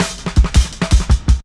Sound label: Shuffling Live Cut, Jungle Drum Loops; Zero-G Jungle Warfare 1, 2 & 3; 90 s Sample CDs